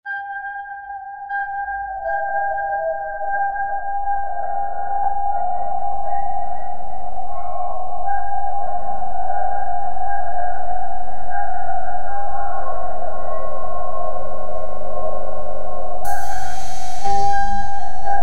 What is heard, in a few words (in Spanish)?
frecuencia 639 Hz meditacion